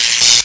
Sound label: SPARK; PUZMANIA